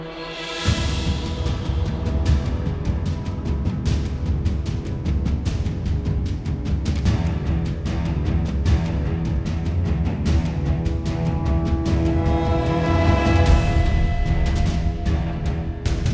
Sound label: Battle